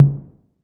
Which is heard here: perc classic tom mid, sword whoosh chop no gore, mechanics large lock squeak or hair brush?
perc classic tom mid